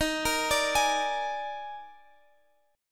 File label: Eb Major 7th Suspended 4th Eb down Guitar Open G